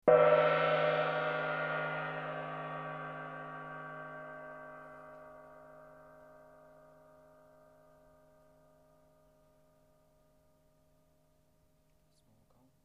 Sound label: Gong